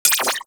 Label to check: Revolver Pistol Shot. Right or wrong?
Right